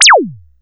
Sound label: ZAP KIT C4; AKAI; USB Soundscan vol.20 - Fresh Disco House I